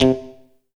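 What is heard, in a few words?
GTR, guitar, funk; USB Soundscan vol.47; AKAI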